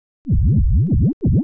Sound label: HOUSE FX 125